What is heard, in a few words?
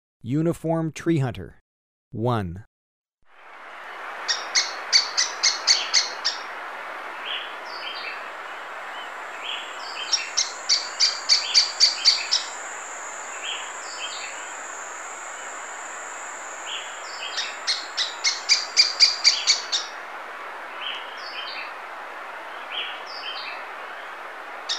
Uniform Treehunter, Birds of Ecuador; S. America